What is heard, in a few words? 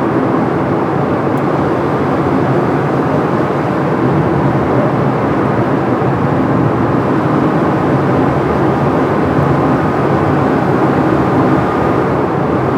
ship engines; World Of Warships